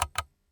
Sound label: Keypress